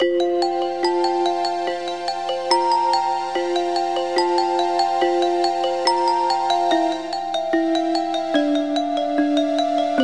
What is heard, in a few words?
tinkle